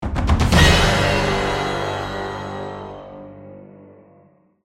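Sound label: Music Stinger Snow Small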